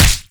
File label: bullet, damage; player, damage